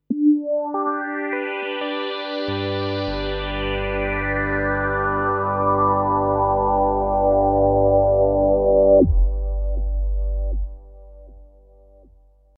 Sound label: Resonant Filter; WAVeBOY Industries